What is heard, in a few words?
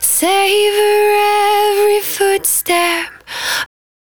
110bpm Ab, Velvet Vocal; Zero-G